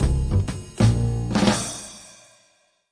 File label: jazz music stops